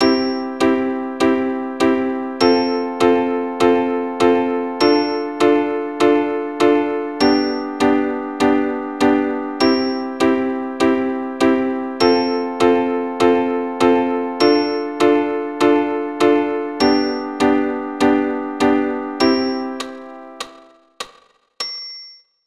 C F Dm G, keyboard

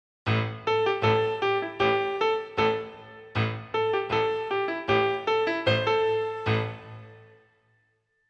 Am arp, keyboard

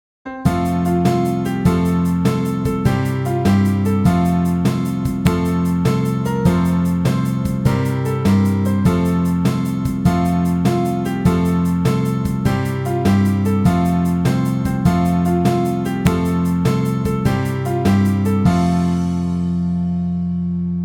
Incy Wincy, keyboard

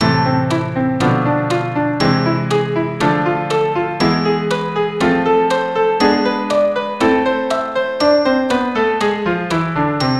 Am harmonic

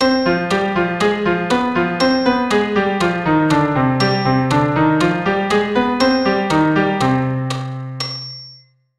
Cm harmonic, keyboard

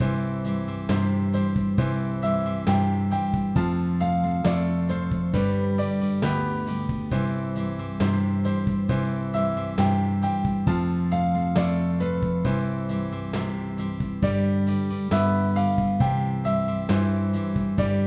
Early One Morning, keyboard

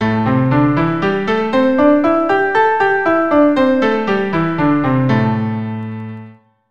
Am pent 2 octaves, keyboard

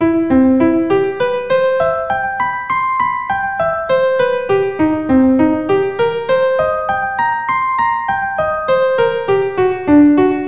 Arp Ex 1 100, keyboard

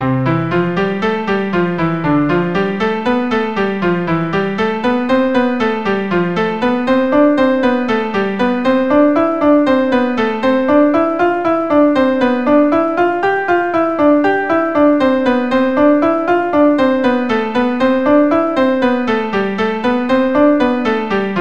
5 Finger, keyboard

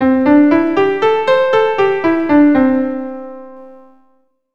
C Major pentatonic, keyboard

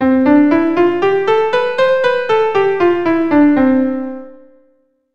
C Major Ionian, keyboard

